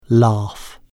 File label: laugh